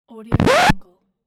record scratch

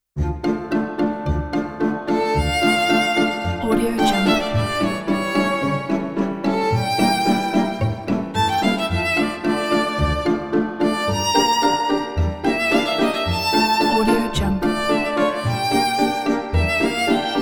emotional classic violin with strings

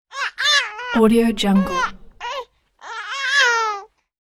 baby cooing; chicasound

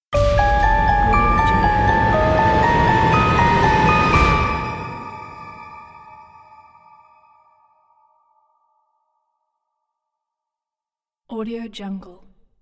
evil gothic stinger

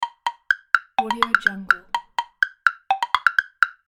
wood blocks video game loop

